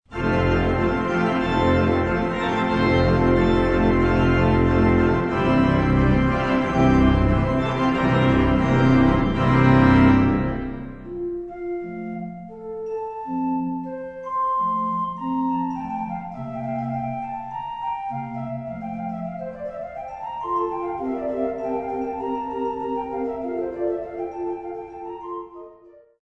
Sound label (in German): Floetenconcert; Knecht